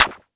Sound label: raw clap single hit d sharp key; single hits one shots; Bitdepth 24